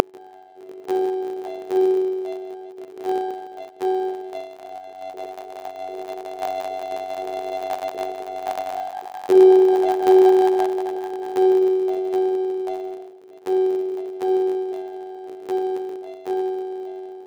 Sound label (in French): violon